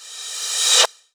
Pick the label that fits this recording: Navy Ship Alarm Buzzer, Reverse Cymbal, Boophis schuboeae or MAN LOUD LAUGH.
Reverse Cymbal